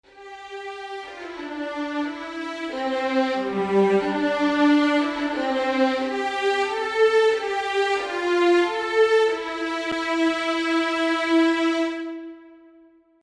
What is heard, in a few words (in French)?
cordes; borodine